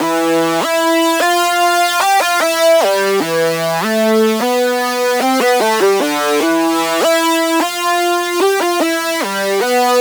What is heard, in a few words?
Lead Loop E Living, Dubstep, 150bpm; Ghosthack; Dubstep Sound Arsenal